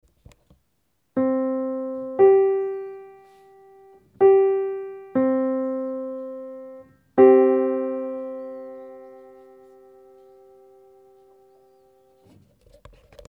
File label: 680 Kirnberger 1 pure